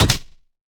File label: switch